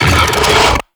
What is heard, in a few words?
Scarab Swarm Buildup; Warframe; Mummy